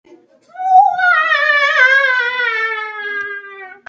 waaaaaaaaaahhh

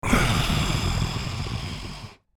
growl

